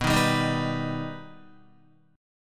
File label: B Augmented B Guitar, chord; strummed; JGuitar